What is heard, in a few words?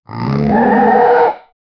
crocodile roar sound effe, aggressive